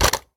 weapon rifle impact; physics; weapons

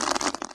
scurry; headcrab classic, movement; creatures

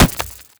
body impact, headcrab black movement

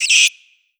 pain, headcrab, creatures; headcrab classic; vox

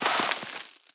debris sand, bullet impact debris

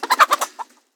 chicken panic; ambient; creatures

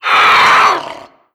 pain, headcrab reviver; creatures; vox

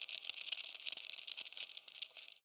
rodent scratch; ambient animal; Counter Strike Model Pack; CS:S

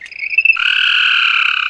antlion grub stimulated; Half Life 2; Episode 2

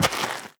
step light, footsteps, gravel

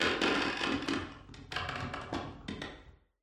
wood board creak; Half Life Alyx

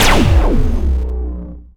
eblaster blast fire, energy pistol; weapons; Half Life Alyx

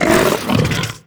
pull grunt, barnacle creatures; Half Life Alyx

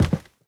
step light, footsteps cardboard

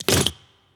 grenade grab, inventory; player

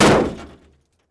door impact; Half Life 2; Episode 1; intro

